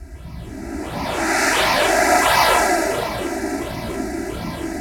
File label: zoomer, synth jets; Zero-G Ambient 1